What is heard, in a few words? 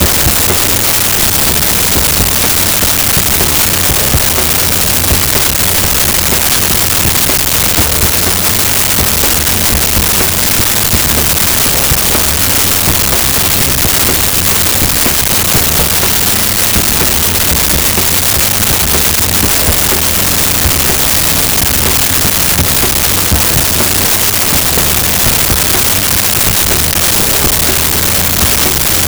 Background loop; russia; Rosegarden